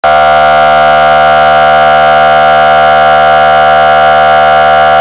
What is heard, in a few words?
HORN, sirene; sirene orbe